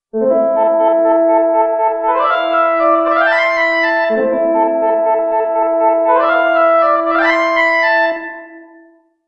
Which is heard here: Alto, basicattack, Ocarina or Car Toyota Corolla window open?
Ocarina